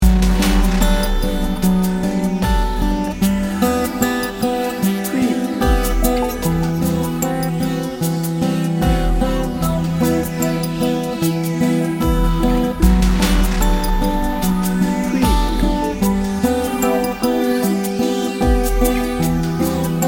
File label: dreamy serene